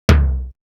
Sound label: Tom, Drum One Shots; Coldcut's Kleptomania VOL1